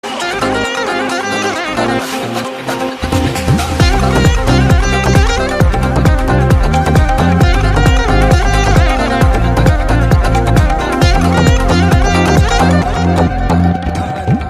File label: Telugu Song instrumental; Varun Doctor